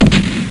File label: kick